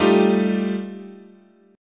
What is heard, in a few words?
G 7th Suspended 2nd Sharp 5th, guitar chord